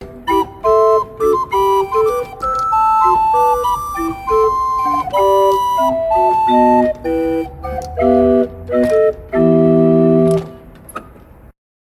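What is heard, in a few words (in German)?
Kliemt 7 II P, pfeifenorgeln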